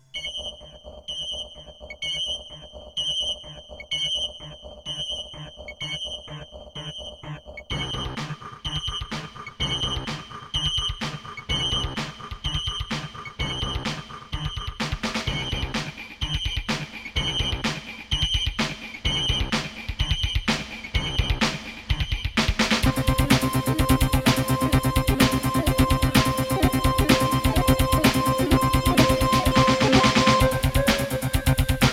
Intro, Casio GZ-50M; System Shock

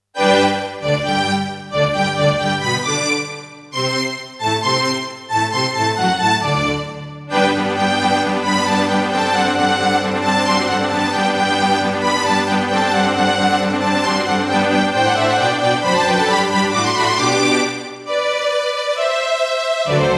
Roland SC-88 55 MAP; Mozart - Eine Kleine Nachtmusik partial; classical

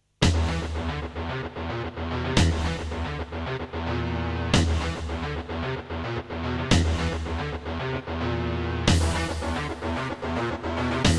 Kawai GMega; Doom - E1M1

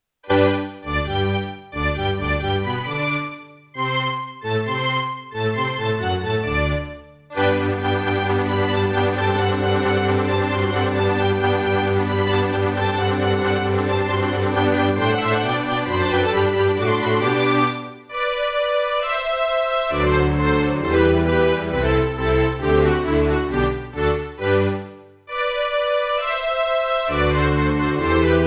Roland XV-2020; Mozart - Eine Kleine Nachtmusik partial; classical

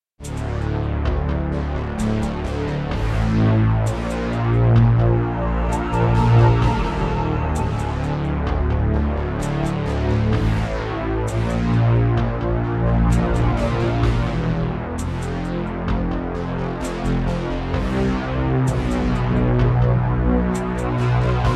Roland SD-90 GSMODE; Descent II

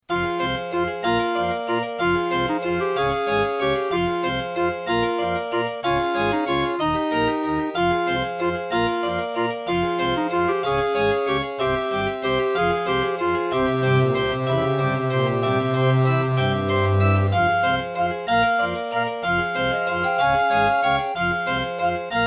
Gallant GMW-1000; Death Gate

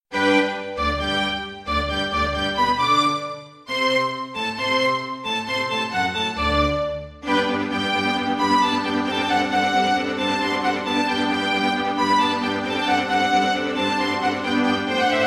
Roland Edirol SD-20 GM Contemporary; classical; Mozart; Eine Kleine Nachtmusik partial

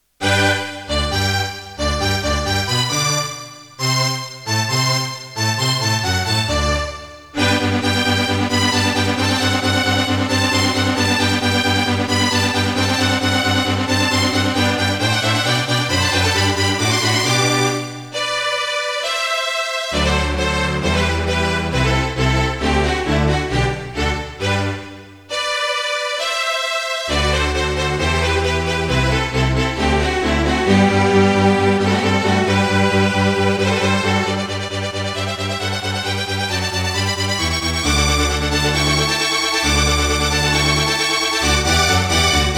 Serdaco Dreamblaster X16GS NATIVE; Mozart - Eine Kleine Nachtmusik partial; classical

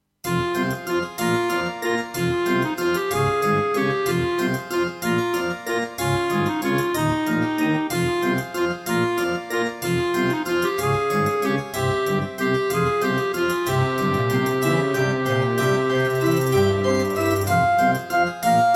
Track 6, Trust Korg Super Sound Module; Death Gate

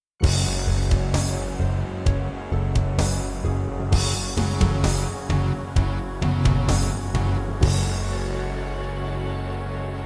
Introduction, Roland JV-1080; Doom (Sigil)

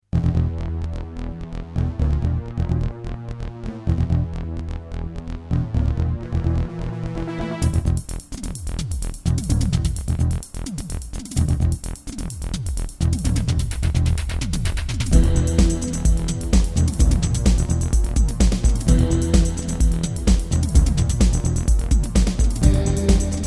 Terratec MiniWaveSystem WT64; Descent - Intro

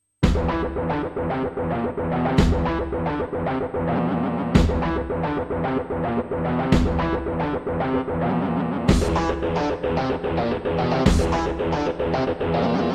Turtle Beach Rio; Doom

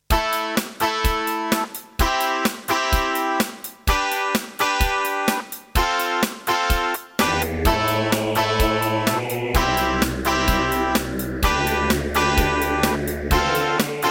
Roland SC-8850; raptor call of the shadows

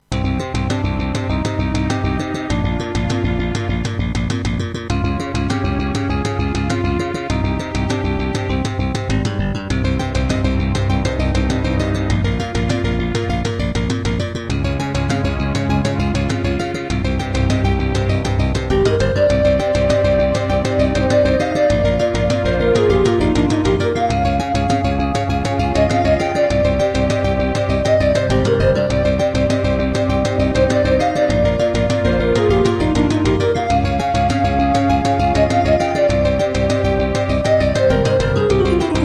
Roland SC-55; Hocus Pocus

